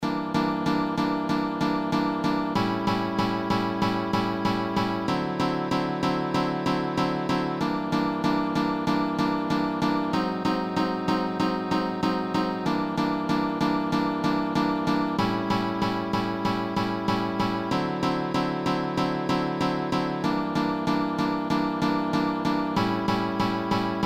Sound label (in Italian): Base Swing gitane